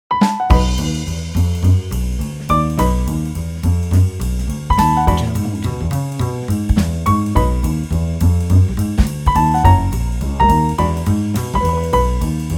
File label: Jazz Piano Swing